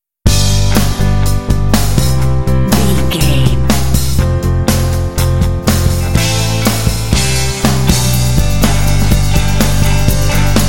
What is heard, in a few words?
country rock jingle bed